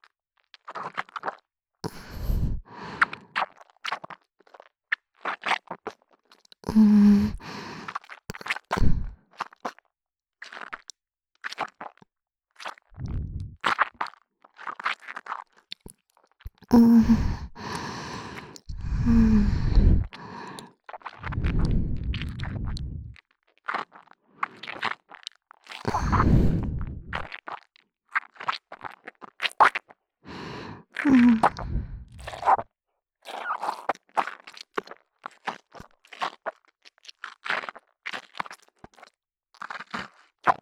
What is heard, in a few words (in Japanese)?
ぐちゅぐちゅ（唾をゆすぐ）, ASMR, 左耳